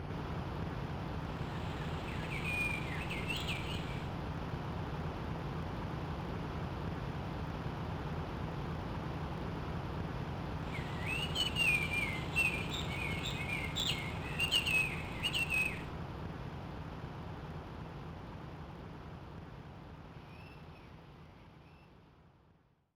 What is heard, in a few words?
Beach Ambience; Kirby Mass Attack